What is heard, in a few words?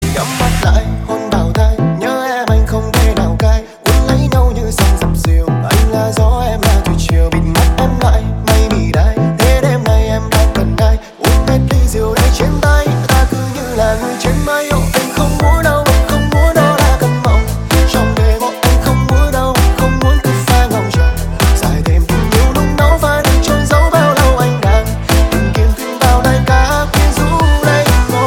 remix